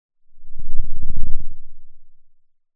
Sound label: Sound of a lion’s roar